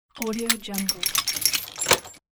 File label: bolt